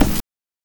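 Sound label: BRK BD, bass drum; NES DPCM; MIDINES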